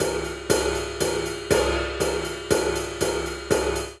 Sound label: EFX Hihat; Drum Kits; Ableton; Drum Booth